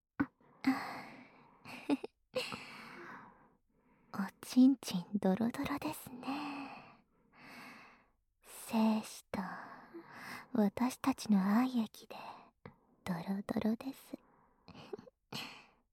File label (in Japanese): 両耳舐め素股マッサージ, ASMR; エッチなお姉さん達